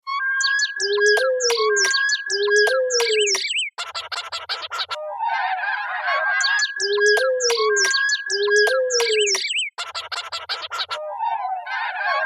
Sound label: Bird Chords